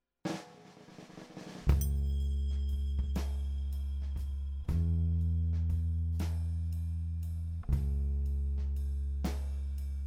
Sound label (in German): roughmix, Drums Bass grooven lassen